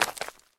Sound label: gravel step; Golden Crayon Sounds